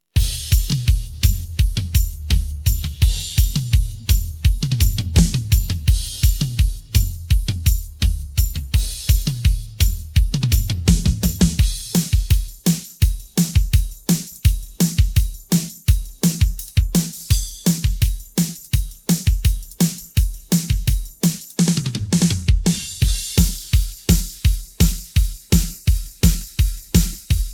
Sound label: drums; סט תופים לייב